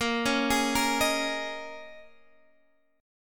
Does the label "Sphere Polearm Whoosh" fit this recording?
No